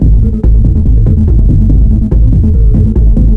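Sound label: club ambience; WhiteOut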